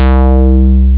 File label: blong; ST-XX Amiga Soundtracker Sample Packs